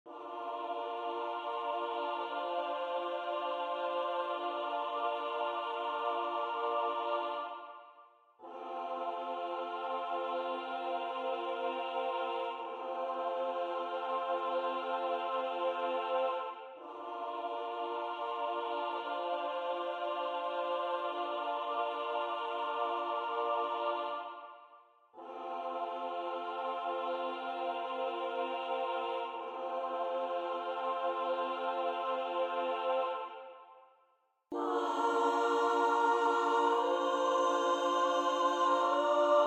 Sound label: choir test